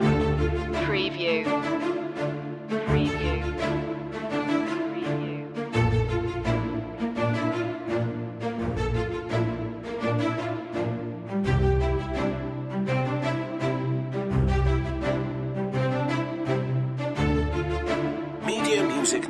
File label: strings loop